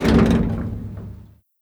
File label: move, hinges drawers; seesaw; Half Life Model Pack; Half Life Alyx